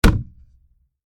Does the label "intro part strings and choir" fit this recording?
No